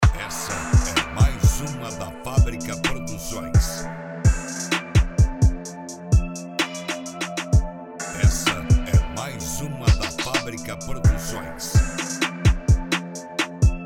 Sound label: beat trap